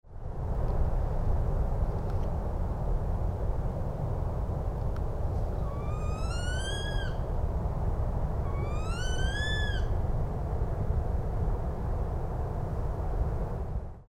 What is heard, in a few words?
Northern Saw-whet Owl; Assateague MD